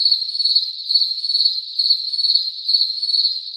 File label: cricket